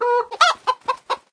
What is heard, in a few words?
Hen Scream; Farm Mania